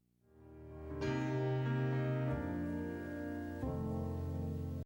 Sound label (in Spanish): Bajo